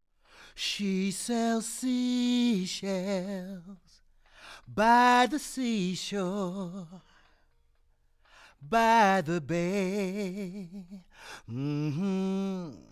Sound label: Seashells Acapella; MJE-K47H